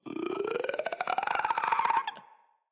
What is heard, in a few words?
Infested Runner Chasing